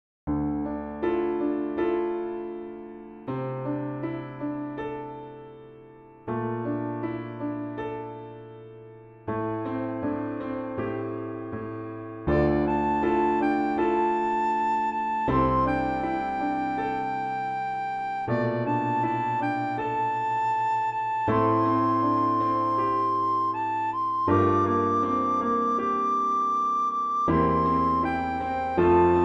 sopraan; Blokfluitles in Utrecht

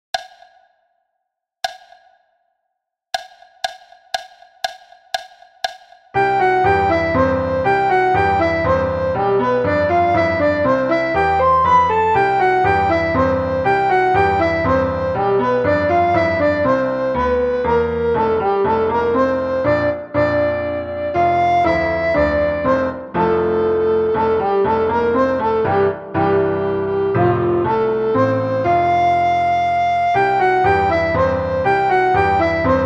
alt snel; Tureluurs 3

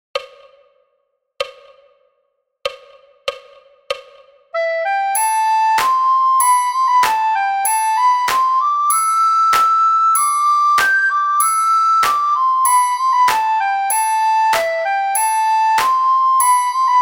Bamboe sopraan rustig tempo; Flierefluiter 6

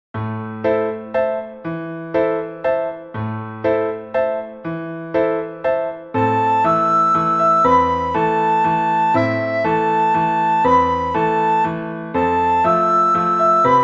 sopraan; Blokfluitles

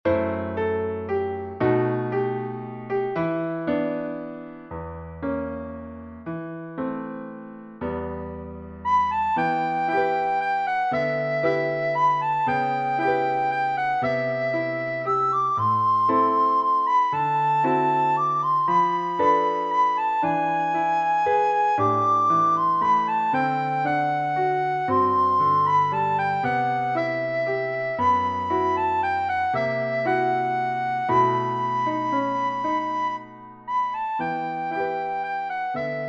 sopraan; Blokfluitles in Utrecht

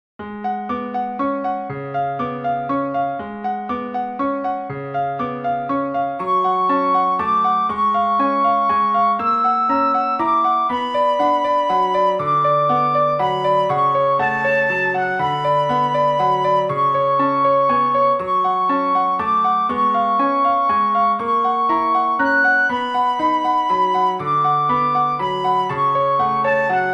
sopraan

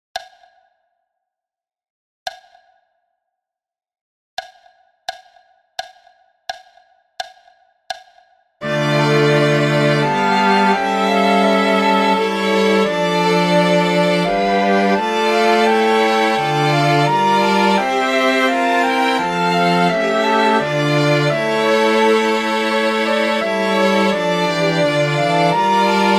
Wiegenliedje alt

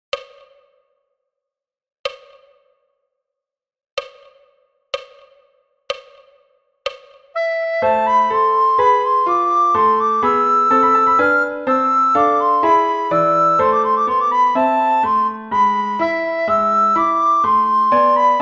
sopraan; la vibray; blavet

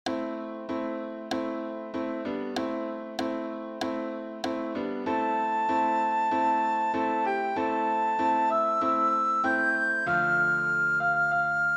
sopraan; Flierefluiter 6

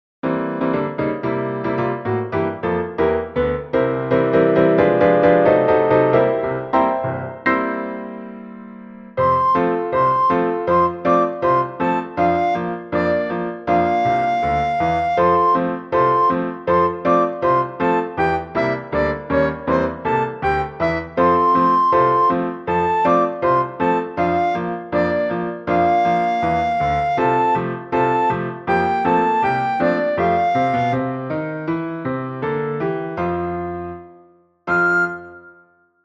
sopraan; Blokfluitles in Utrecht

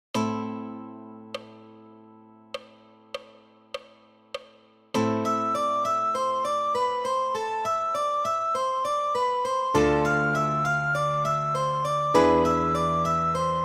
sopraan; La Comparsa

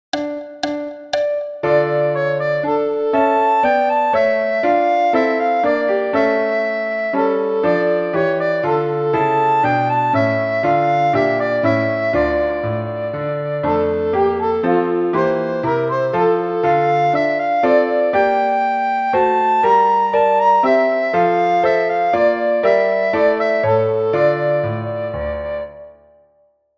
menuet alt; Blokfluitles in Utrecht

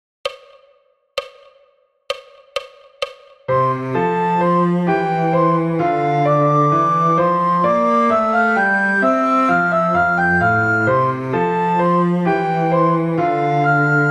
sopraan; Aria d-klein Telemann; Flierefluiter 7

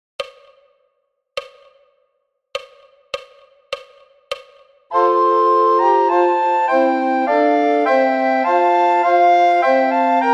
sopraan; Flierefluiter 5

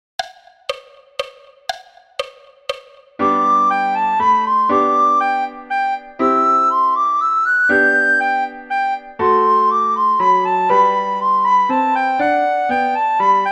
sopraan; Paddenstoelendans; Blokfluitles

